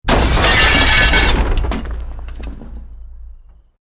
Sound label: EXPLOSION MEDIUM BRICK WOOD GLASS NO FIRE